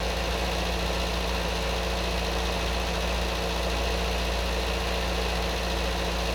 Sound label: Vintage Sportscar Loop; android; Does Not Commute